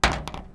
metal; Mafie 1

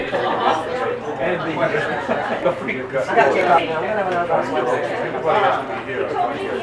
whimper; Mafie 1

